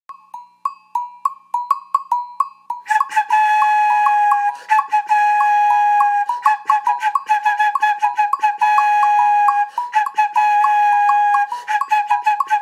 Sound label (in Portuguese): Marinheiro só